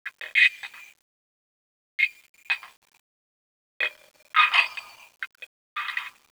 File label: Glassbreak; Chalice Well